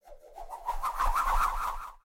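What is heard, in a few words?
disc in flight spinning whistle, boomerang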